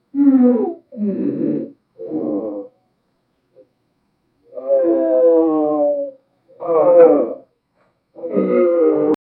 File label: low pitched pixelated gro